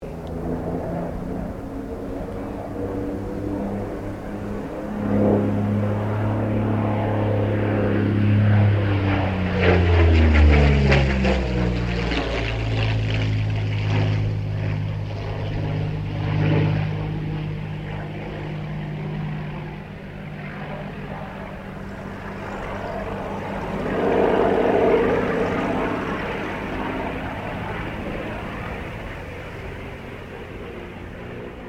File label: AIRCRAFT P-40 KITTYHAWK PASS STEREO